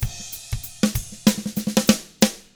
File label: FUNK, Drums; ILIO; Double Platinum Drums 1